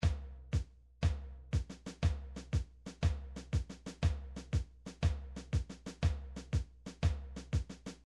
midi drums march

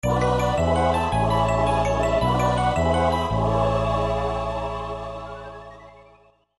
game over; Game System Super Nintendo; Twinbee

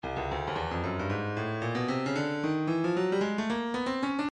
breakbeat 113 bpm; Moth B; Stylus Breakbeat Midi Files

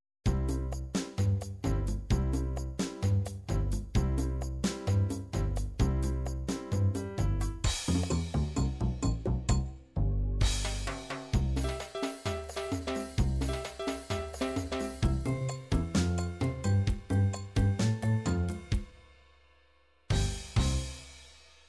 latin calypso, midi

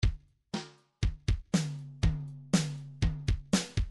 midi file grooves rock; Bdf Midi File Grooves

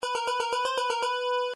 gameboy game over, midi; Tetris

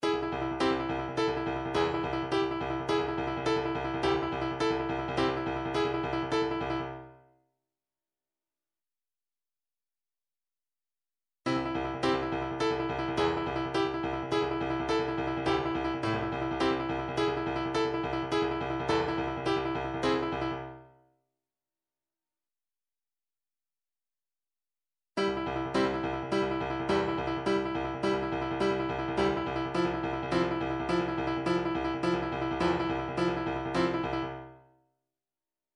steady beat 105 bpm; engine

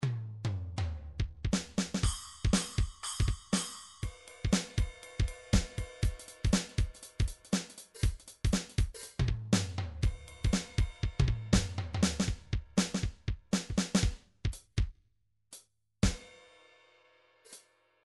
midi drums, shuffle; Midi Drums Drums 5of6 (drums) Shuffle3

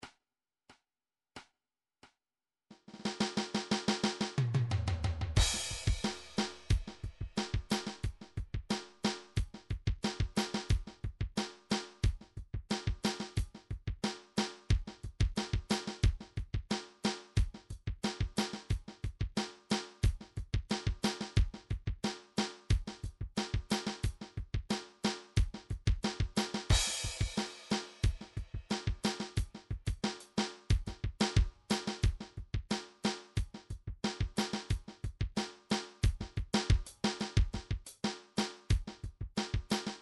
hard rock 090 snare train; Sonar

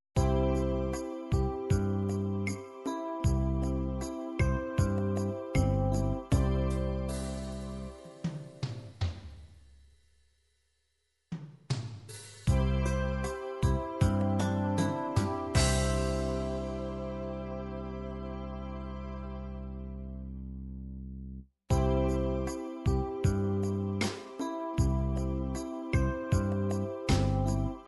latin bolero, midi

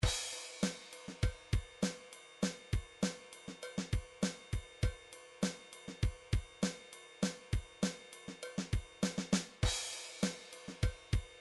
midi drums drums

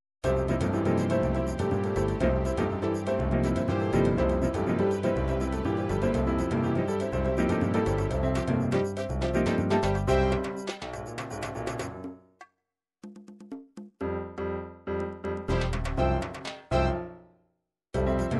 african conga

